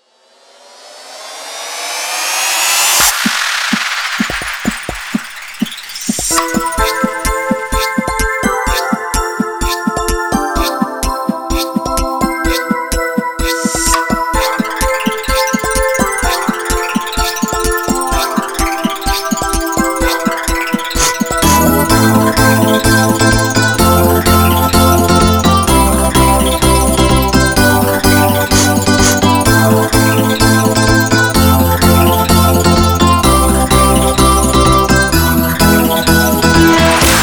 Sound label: divine trance